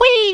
jump; monkey

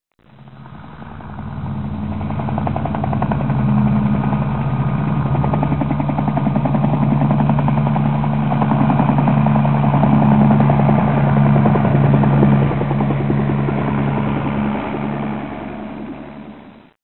chop fly; baseq2

